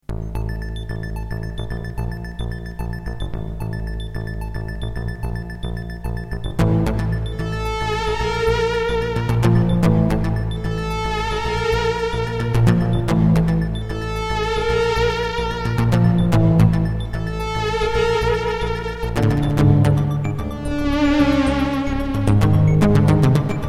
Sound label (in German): Ohne Stimmen Roland MT-32